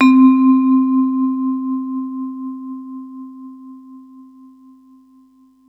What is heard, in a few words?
mallets